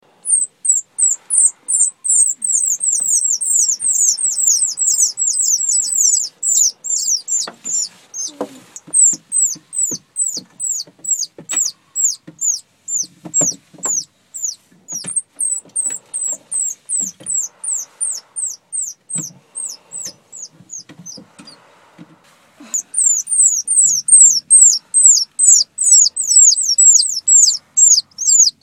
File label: Multiple Baby Squirrels Vocalizations